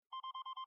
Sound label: alarm, computer